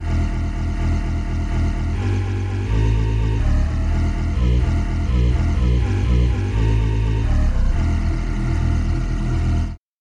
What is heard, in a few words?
synth voice bass